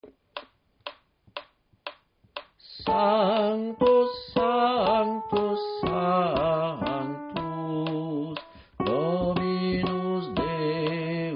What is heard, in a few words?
soprano